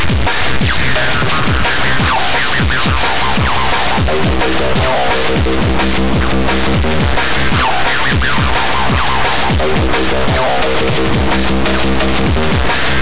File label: dnb